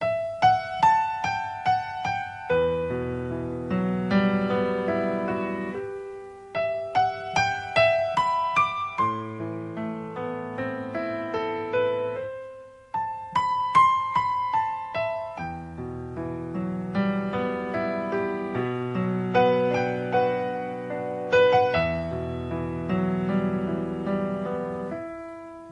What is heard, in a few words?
music; ryu